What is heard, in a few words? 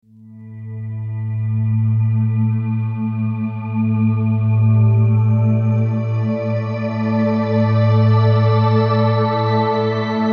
Ambient verb